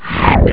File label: MOVE; BRAIN ACTIVITY; OOZE AGA